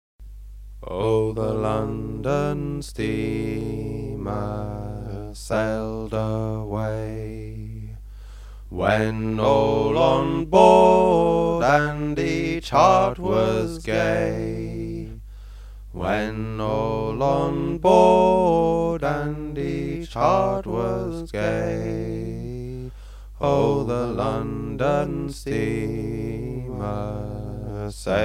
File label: London Steamer melody with alto harmony sung; singing histories